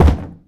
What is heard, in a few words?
underground cube soft